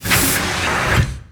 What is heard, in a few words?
pressure valve close